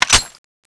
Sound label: clip in